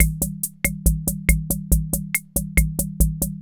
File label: 140 POPS